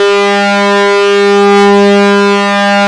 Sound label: MINIMOOG; Rubber Chicken Software Sample Bank; OLD SYNTHS